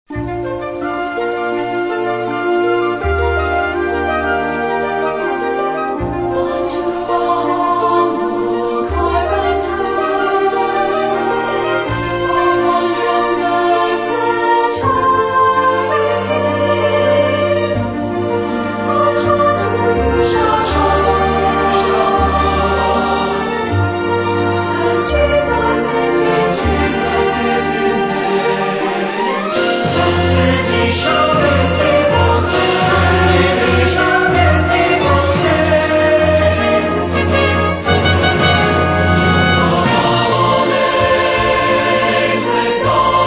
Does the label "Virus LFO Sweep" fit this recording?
No